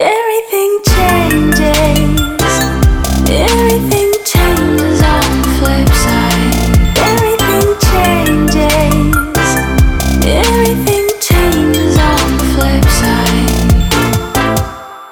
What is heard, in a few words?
trap